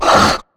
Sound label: take Damage; Orangeboy